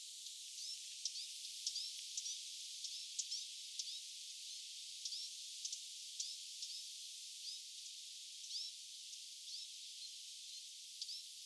nuorten hernekerttujen aantely muistuttaa hieman vihervarpusta

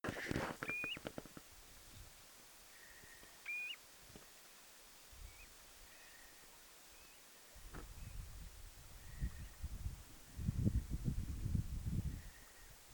tyllin aanta

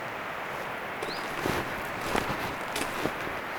tama voi olla sen kuvien tiltalttilinnun

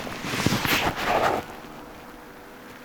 siina taitaa olla jankakurpan aani kun se nousi ihan parin kymmenen sentin paasta ilmaan

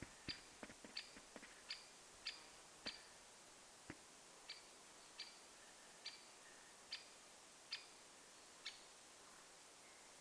olettaisin reviiria etsiva tanne juuri muuttanut kapytikka aantelee

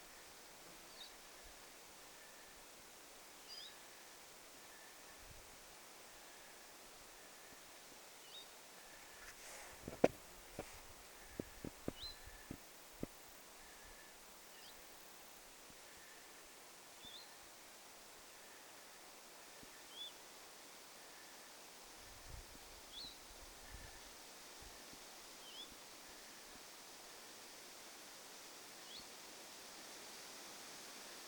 pajulintulintu aantelee

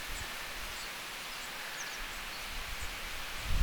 pajusirkkulinnun sae; tuollainen pajusirkun säe

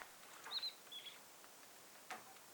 musikaalinen lirolintu

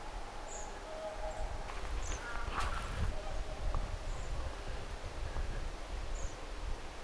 rastaslintujen aania

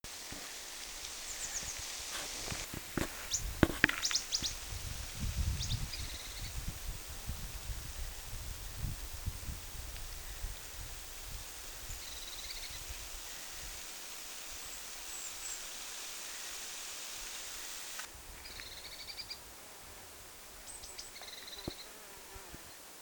ihan eri tavalla aanteleva sinitiainen; kuvien sinitiainen

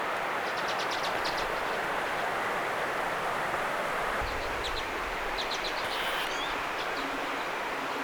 vähän urpiaislintujen ääntä

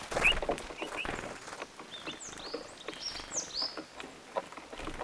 homotiainen hiukan laulaa

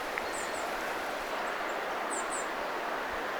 tuollaisia pariaania ilm kuusitiainen

